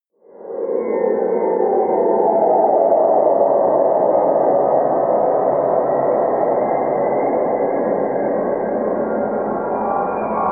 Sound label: abyss pad